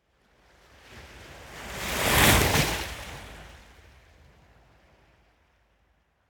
Ocean Surf Water Lap On The Coast Surf Medium Faster Waves; Hollywood Edge; sound designer's toolkit v2